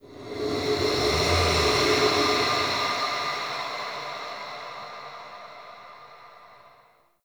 Cargo Hold Reaper Whisper; Wraith Quest Cargo Hold; Warframe; Cinematics; Call Of The Tempestarii

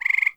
Forest Frog Close; Warframe; Grineer

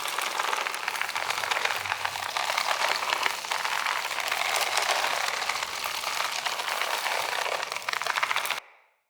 Wild Snare Loop; Powers; Fey

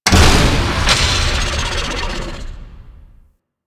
Gas City Prison Tower Stop, Ambience; Warframe; Corpus Gas City; Gameplay Remaster

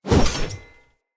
Short Sword Whoosh Heavy; Weapons; Enemy; Swords; Short Sword Elite

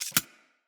Arachnoid Micro Step; Corpus

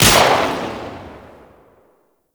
Pistol Shot; Warframe; Weapons; Tenno; Cowgirl Pistol

